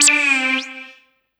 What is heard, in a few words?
SYNT, Drum & Bass, 200 BPM; Ueberschall; Drum & Bass Resonance